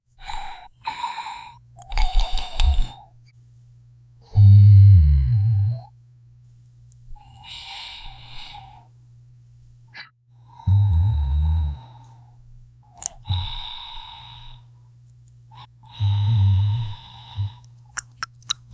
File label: baby dragon breathing and